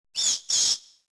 noise

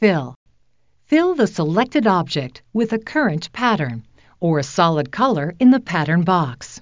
fill

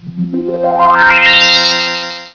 Cosmic O; Sound Library 2000; Wayzata Technology

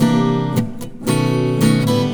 Acoustic Guitars; ACID MUSIC; Breezer

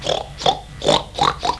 pig; Little Red Riding Hood

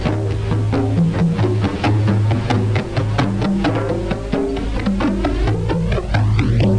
Drum Run Slow; Museum of Anything Goes

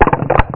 CRUNCH

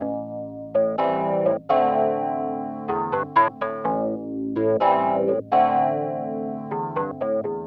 ORGAN HOUSE 125 A; eJay; Keys

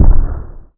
thump

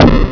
bang

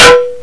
Metallic sound; Olduvai Sounds

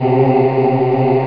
Choir Aahs C2; SoundMusicSys; Simple MIDI Player

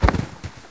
bdy bmp; duke nukem total meltdown

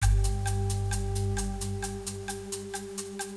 sting

